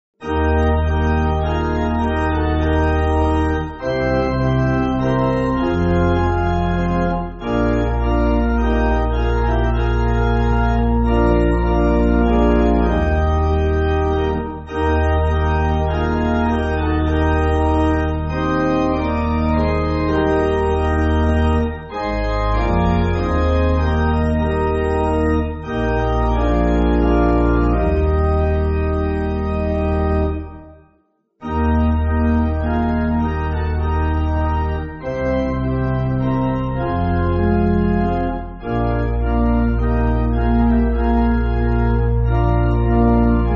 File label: Pipe, church music; How Gladsome; Sankey